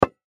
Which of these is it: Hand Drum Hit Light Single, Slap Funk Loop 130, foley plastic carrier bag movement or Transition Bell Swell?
Hand Drum Hit Light Single